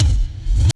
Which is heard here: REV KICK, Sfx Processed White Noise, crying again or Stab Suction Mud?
REV KICK